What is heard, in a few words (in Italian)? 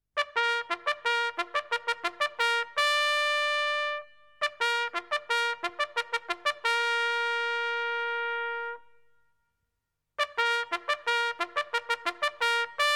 suonerie tromba militare